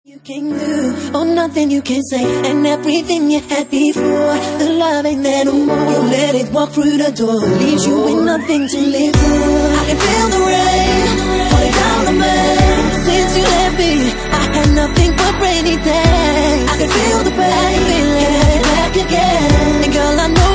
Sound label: Organ Mix